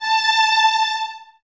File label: Violins A 5, String Ensembles; Miroslav Vitous